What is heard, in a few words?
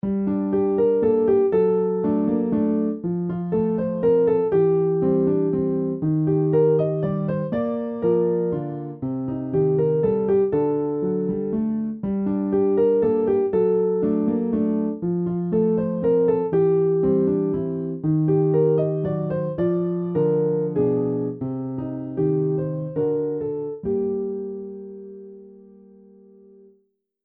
Waltz